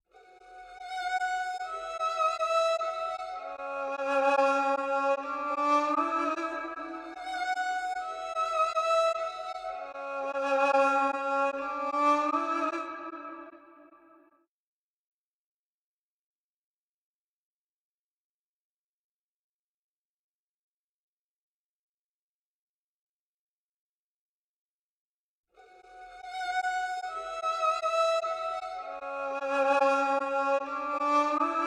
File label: 151 BPM B Min Violin, Trap; Cymatics; 2022 Melody Collection; G Wagon